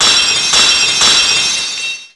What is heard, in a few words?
lock break; PsycheLock